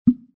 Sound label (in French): pose bombe